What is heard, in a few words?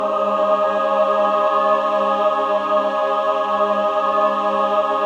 SUS G#4, SUS.CHORD, Symphony of Voices